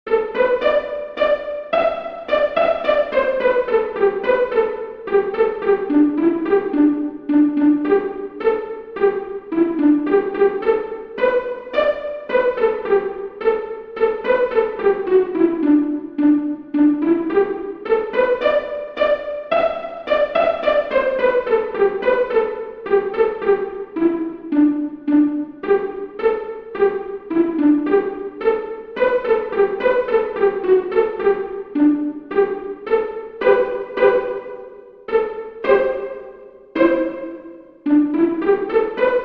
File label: Solo Break 108bpm, mandolin